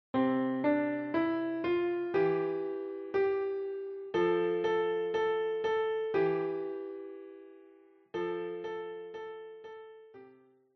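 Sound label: piano; Alle meine Entchen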